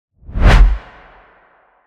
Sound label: whoosh Vortex